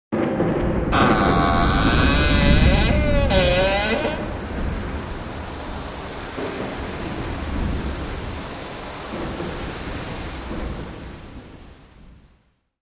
Spooky Door, Creepy creaking door opens with ominous sound of thunder.; Creepy Doors; Horror